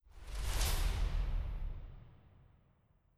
taikos rolls; Netease; kill boss phoenix

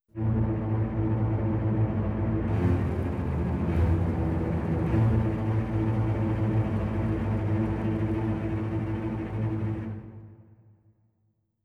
strings trem; combat results lose; Netease

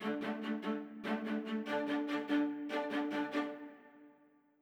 violas; Netease; kill boss phoenix

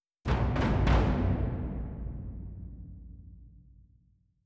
drum low; Netease; gank

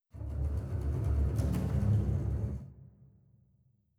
basses fx; Netease; gank

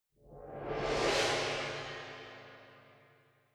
gong; Netease; gank

